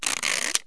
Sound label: tear ppr, office money; KABOOM! - Wild and Wacky Sound Software